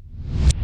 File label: KICK REVS